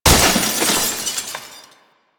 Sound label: glass window break; Nineteen Ninety Nine